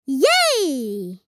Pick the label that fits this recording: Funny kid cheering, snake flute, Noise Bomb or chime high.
Funny kid cheering